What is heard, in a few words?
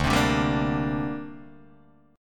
D 7th Suspended 4th Sharp 5th down Guitar Open D